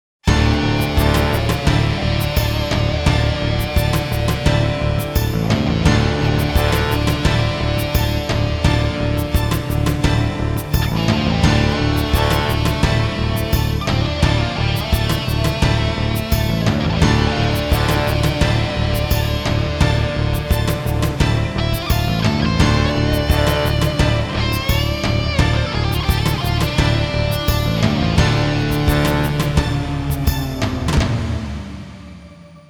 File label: battle - instrumental; City Hunter Special '99 original soundtrack